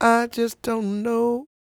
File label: croon, Vocal Planet Blues Soul; Spectrasonics